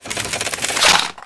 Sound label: gunner reload